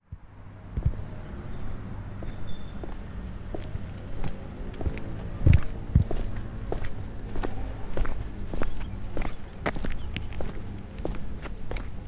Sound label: Footsteps On Pavement